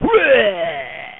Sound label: BL BEAT; Piposh